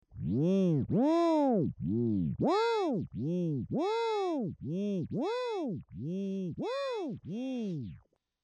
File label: delay pitch